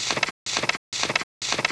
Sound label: load cannon; gunslinger